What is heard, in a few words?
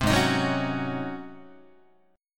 G sharp 11th G sharp, down, Guitar; strummed; JGuitar